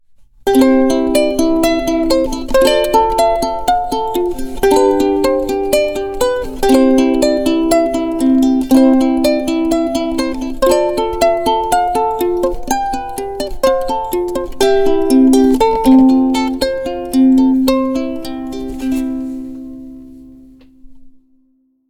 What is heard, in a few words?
Solo